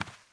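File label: dirt slow walk, footsteps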